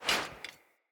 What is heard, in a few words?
Dagger Melee Whoosh Layer; Weapons; Player; Swords